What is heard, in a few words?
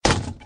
wood hit